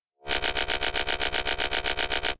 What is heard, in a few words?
Electric strobe